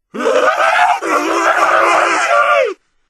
elec

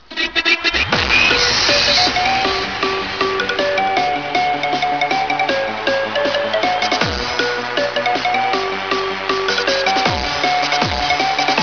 Bass Is Kicking

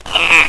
pain

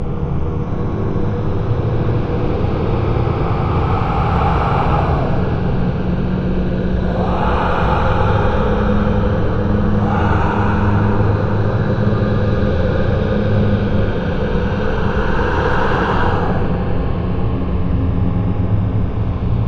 inside; ts tomb2

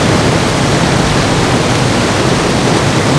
waterflow cave outside

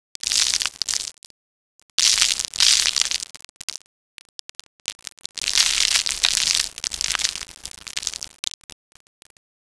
falling grit, rocky; ambience; sadpuppy

